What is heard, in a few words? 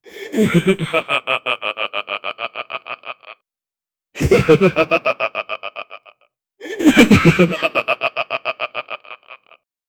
sound effects from a, mobile game